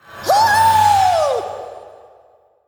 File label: Jade Elemental Play